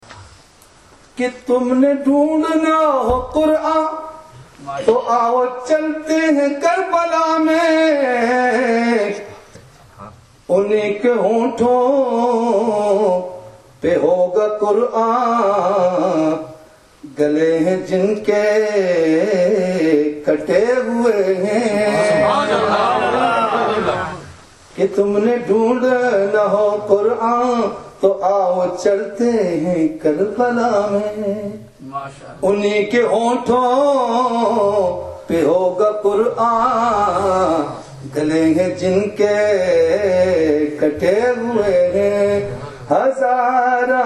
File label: urdu naat